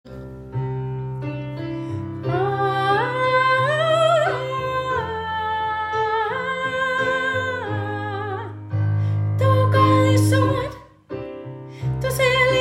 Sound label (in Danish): i morgen er der også en dag sopran omkvæd